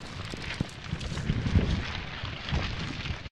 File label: Slide Path Left; Cutscene